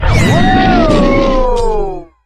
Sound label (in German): Ruf